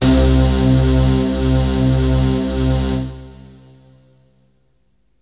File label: DEEP DIRT, BASS; SoundFactory; SMPMANIA